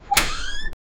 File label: futuristic spaceship door; scifi